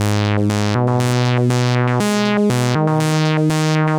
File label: Ab 120, Synth Loops 120bpm; Acidized; Zero-G; Soundclash Nu Dance Electro